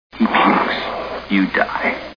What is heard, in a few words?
he pukes; weird science